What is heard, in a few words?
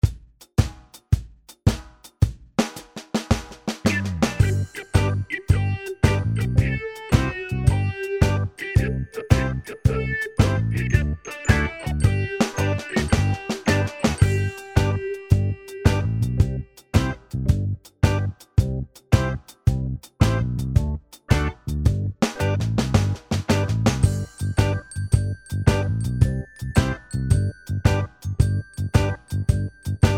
Karaoke